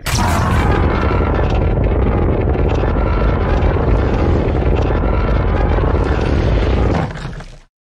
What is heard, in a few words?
atomic breath loop; zoonomaly cat titan